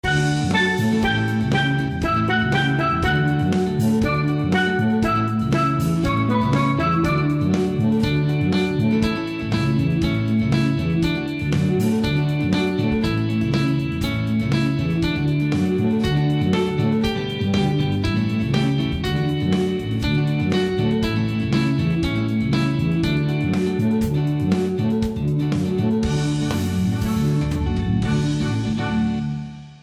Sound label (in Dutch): Aankleedliedje instrumentaal; Peuterplein